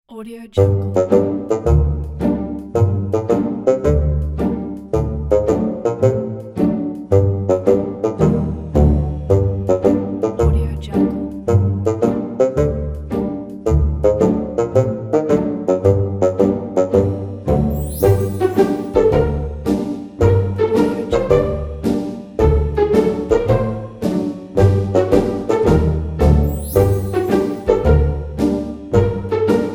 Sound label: sneaky and quirky comedy